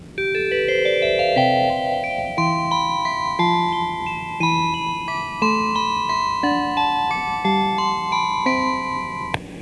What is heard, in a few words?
chime; 名鉄キハ8500チャイム(JR東海タイプ)